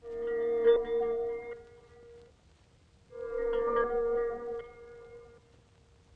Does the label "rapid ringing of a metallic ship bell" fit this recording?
No